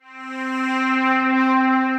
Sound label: Pad; Galactic Pad; Ableton; Retro Synths; Vector; Vector Patches